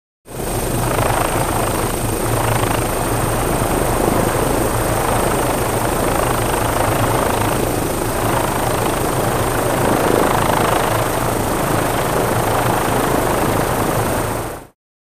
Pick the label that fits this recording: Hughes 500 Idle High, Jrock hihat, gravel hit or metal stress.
Hughes 500 Idle High